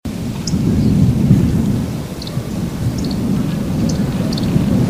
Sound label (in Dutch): Geelgors, trekroepjes; tijdens de vogeltrek